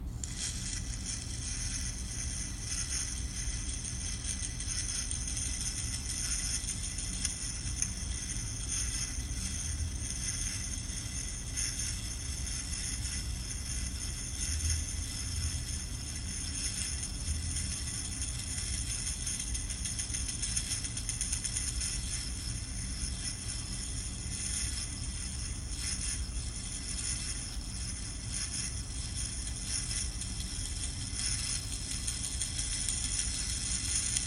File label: Filtered bat noises